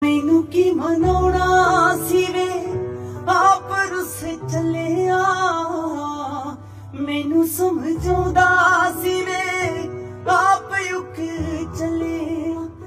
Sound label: Ringtone